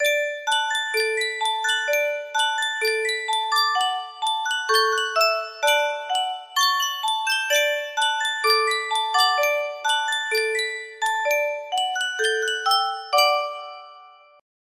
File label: music box, caja de musica; sankyo